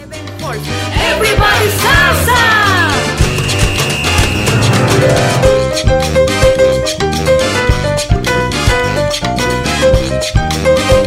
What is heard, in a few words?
salsa